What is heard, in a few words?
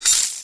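SLINKY HYBRID, PERCUSSIVE; Rarefaction; Poke in The Ear With A Sharp Stick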